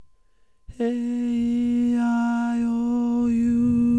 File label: aeiou High